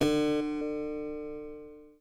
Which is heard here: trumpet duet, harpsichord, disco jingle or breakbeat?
harpsichord